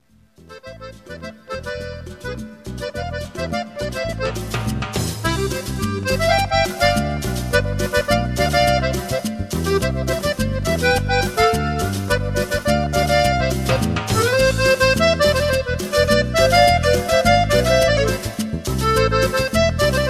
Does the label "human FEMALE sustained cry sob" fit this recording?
No